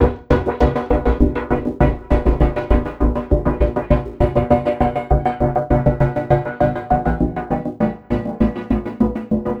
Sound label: Envelope Beat; Drums & Drones